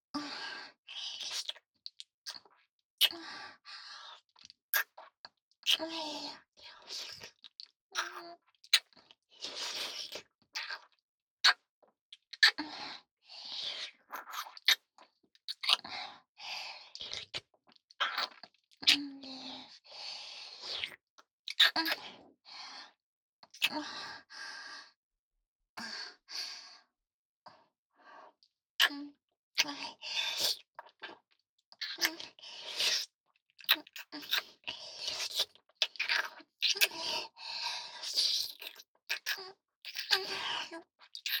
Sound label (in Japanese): ベロチュー、バキュームフェラ